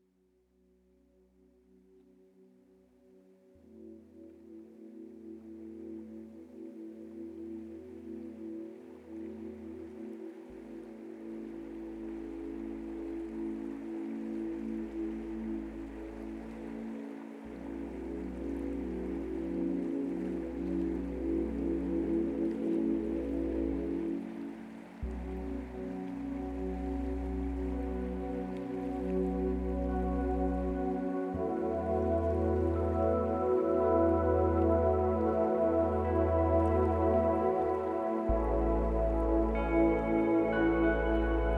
Rainfall

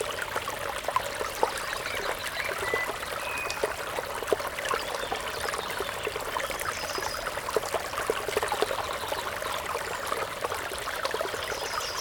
Stream Small Birds Close

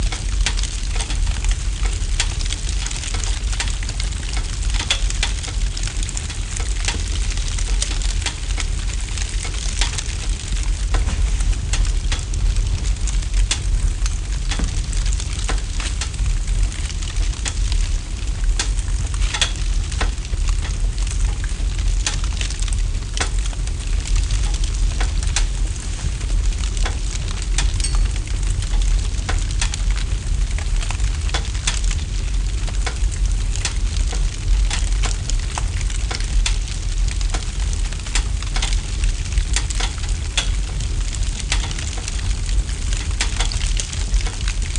Bonfire Night

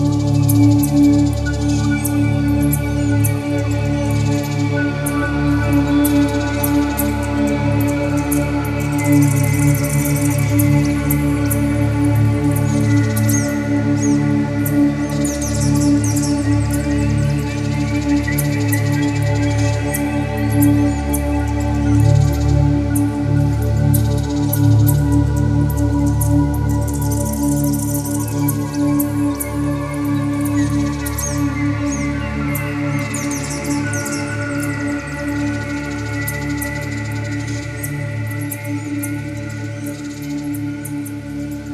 741 Hz Solfeggio

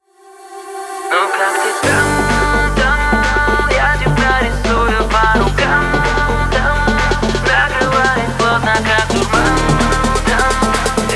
Bass

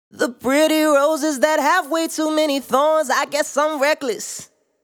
Vocal Efx; Vocal Efx Tools

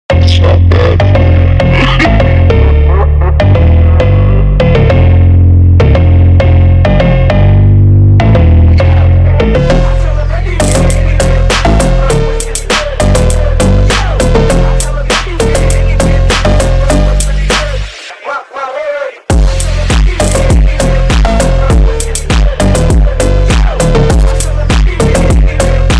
Phonk MINUS

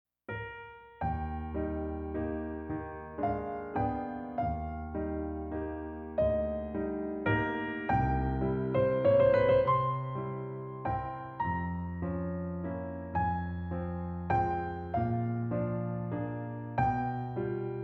Piano

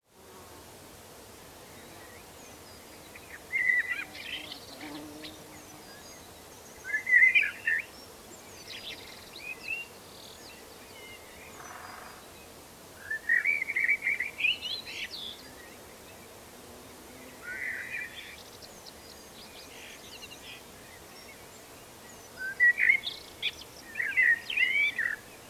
Forest Ambience

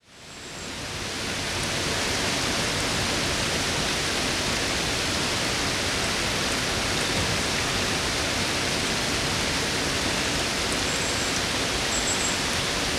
Rain Recordings, Heavy Pouring Rain

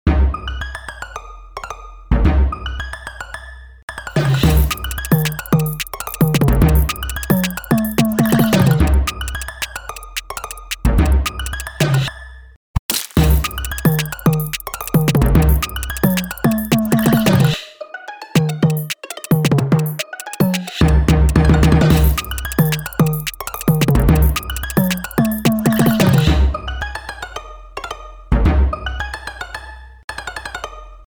Trap Remix nightcore; Amogus Meme Music

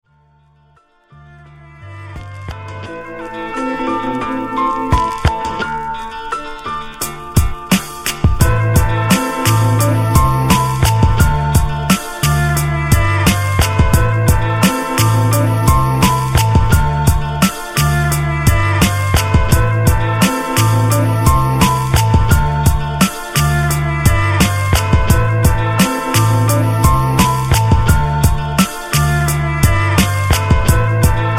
minus Instrumental

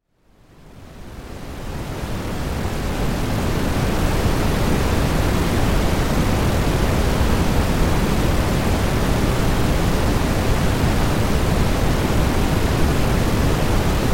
White Noise; Tmsoft s White Noise Sleep Sounds

